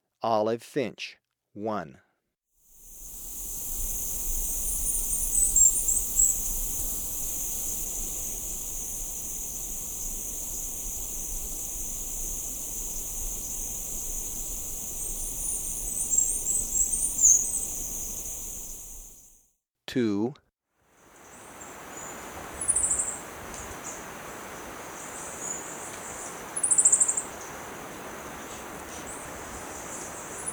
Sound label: Olive Finch; S. America